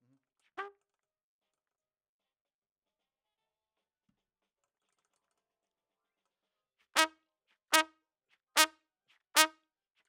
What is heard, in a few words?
Trump